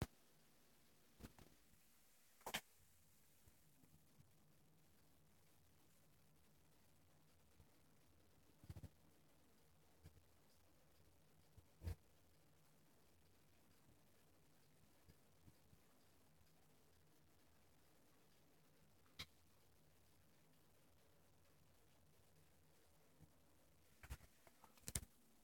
industrial glitch in light fitting creates trance beat